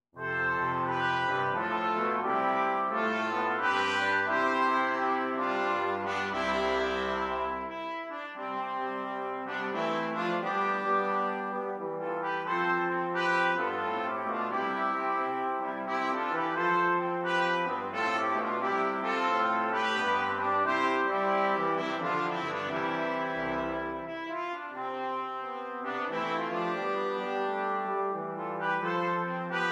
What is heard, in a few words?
jazz brass, brass quartet; the first noel; first nowell